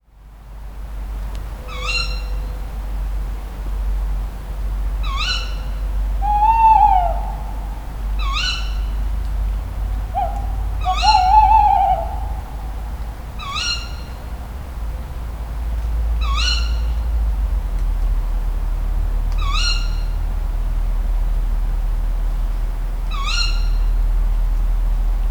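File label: pustik obecny modranska rokle, tawny owl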